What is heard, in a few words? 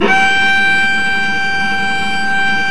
STR VC HAR, Vc Harmonics; String Master Series; Vc Marc&Harm